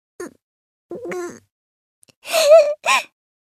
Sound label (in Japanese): エモーション（悲しむ）「うっ…ぐっ…（すすり泣き）」